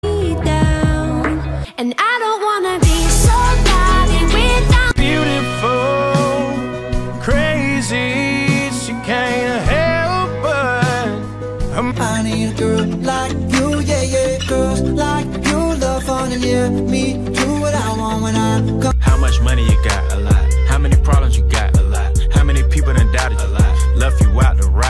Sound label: hollywood song ringtone